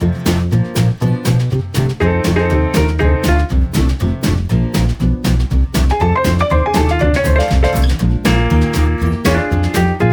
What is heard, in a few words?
Tutorial Loop; Tutorial Music Bank